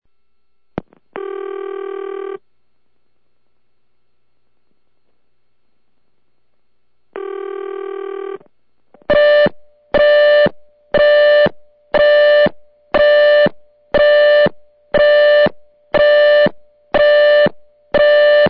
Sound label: busy, Bell System